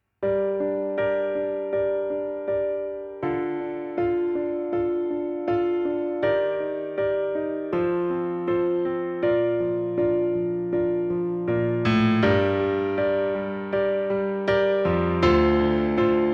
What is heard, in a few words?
instrumental